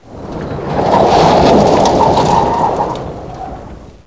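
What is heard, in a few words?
SKID DIRT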